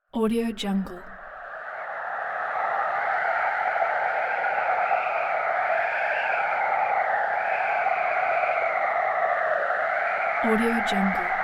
arctic wind

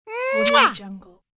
cartoon female comical kiss

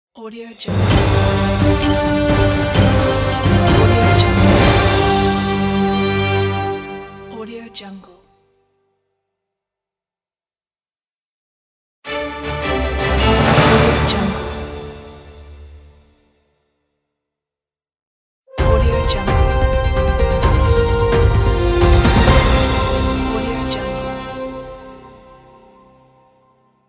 news logos pack

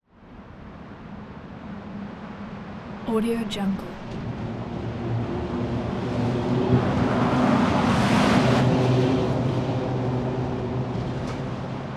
wood bridge rattle vehicle pass bys

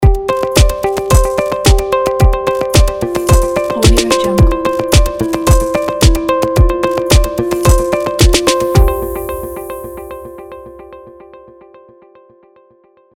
modern corporate logo

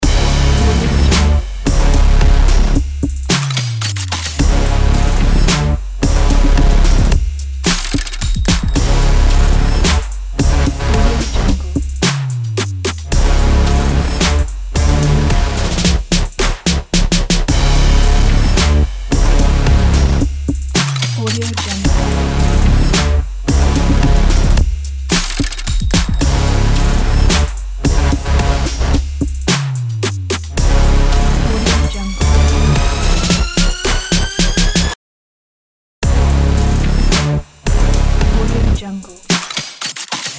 swag brassy loop; lunamycreations

